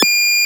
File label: interface, SPACESHIP; BATTLECRUISER